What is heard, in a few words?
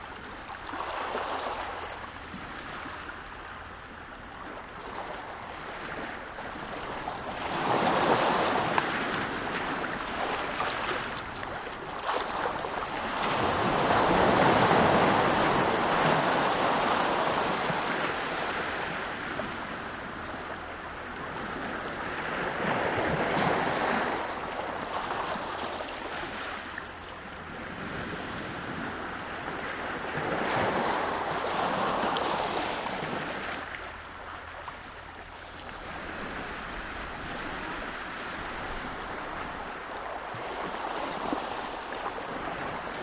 Shoreline - Tidal Waters; OCEAN; Sounds of the Earth; Sleep Sounds of Nature